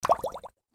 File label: ripple touchdown, LG G3